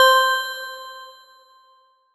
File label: lap complete; ludumdare40